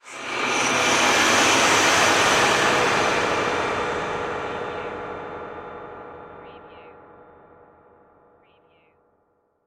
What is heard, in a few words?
Metallic